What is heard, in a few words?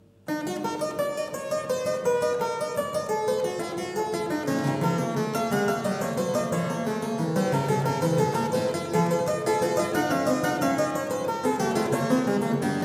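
Fugue in E minor; Fugue 10; BWV 855; Bach - WTC - Tilney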